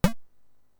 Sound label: Button Click; lemmings atari st 1990